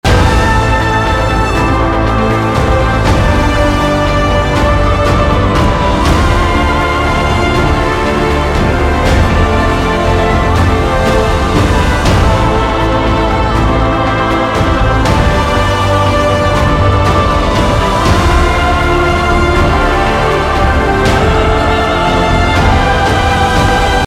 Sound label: Loop Action